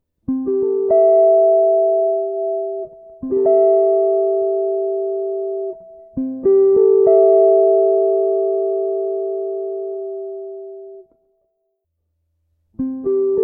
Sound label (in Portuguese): Menor Melodica posicao fundamental; Jazz Harmonia